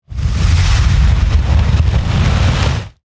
Whoosh Flame, Whoosh, Flame, Heavy, Production Element, Imaging Element, Accent, Transition; BlastWave